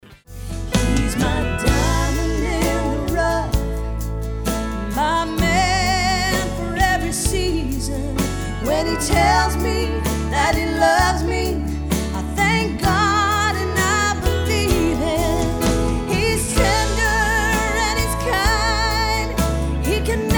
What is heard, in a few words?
SONG